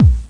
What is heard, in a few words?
hurt thud; Ferazel's Wand Demo